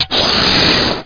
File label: tire out